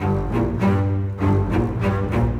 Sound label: Bass Cello, Orchestral; Sonic Foundry (Sony Creative Software); Orchestral VOL-2; Modern 32